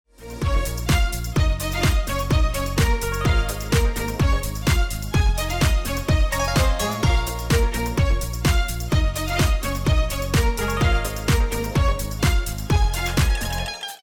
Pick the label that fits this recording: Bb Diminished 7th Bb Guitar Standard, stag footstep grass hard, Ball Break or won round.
won round